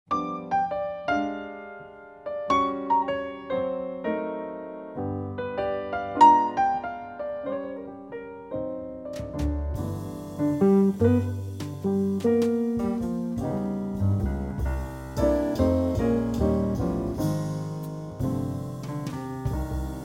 Trio

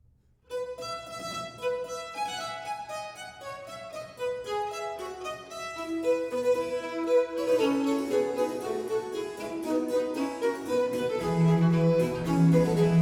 Sonata in F major - Canzona Allegro; 헨리 퍼셀; 4성부를 위한 10개의 소나타

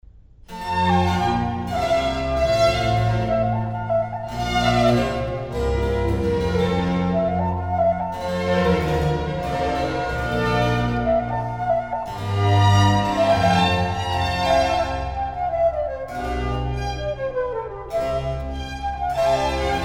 Gigue; Rameau; Les fкtes d'Hйbй; Air gracieux pour Zйphyre et les Grвces

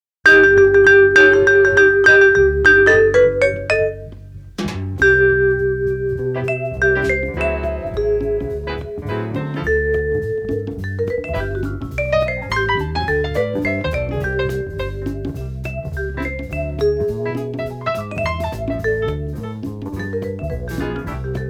Jazz

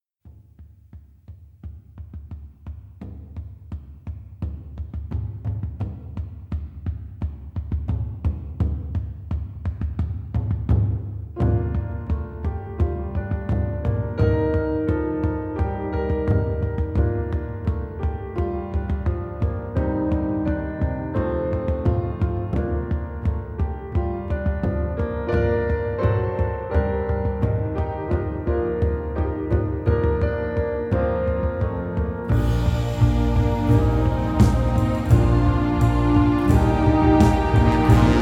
March

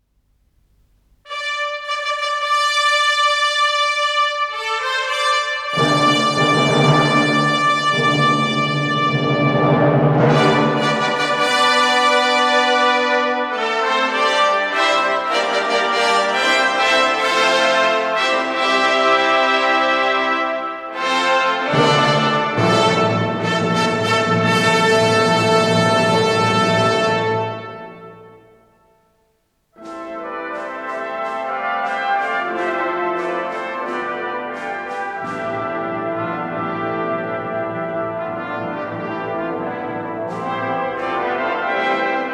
Fanfare, Orchestral Music; Orchestre National De L'O.R.T.F; Debussy; Musiques pour le Roi Lear

